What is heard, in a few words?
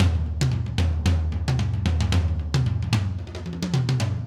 Acoustic Live Drum; Platinum Audiolab